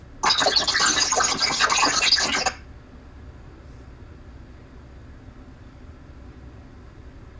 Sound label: call noise